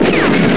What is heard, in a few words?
FIRE TANK